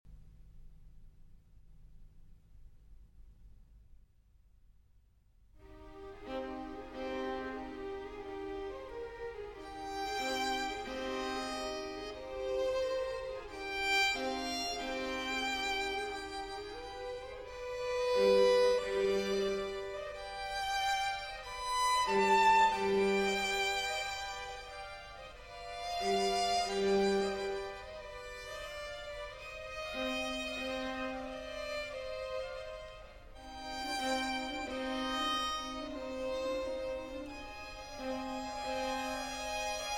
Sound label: Concerto For Violin and Strings in E Major, La Primavera